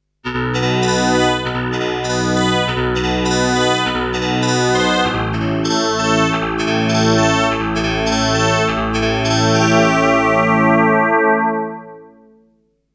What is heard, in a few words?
tx7 harpsi